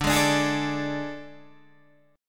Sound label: A Major 7th Suspended 4th D